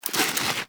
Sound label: Armadillo Roll Snow; Warframe; Characters; Hunting Critters